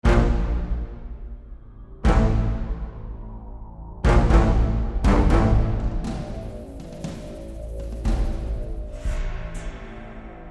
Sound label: Tension